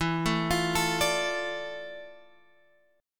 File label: D Minor E, chord; Dm/E arpeggiated; JGuitar